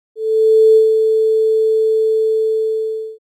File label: bip